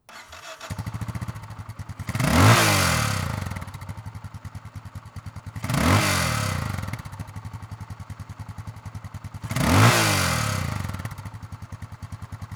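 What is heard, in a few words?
MuZ Mastiff, motorcycle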